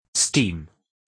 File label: steam